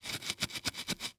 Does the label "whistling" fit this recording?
No